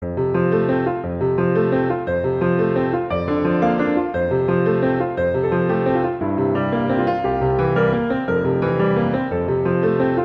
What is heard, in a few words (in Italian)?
Andante espressivo; Mendelssohn; Op 85